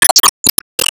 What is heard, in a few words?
Enemy Delete; disney star smash android ios mobile gamerip 2020